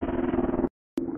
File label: growl; Dragonine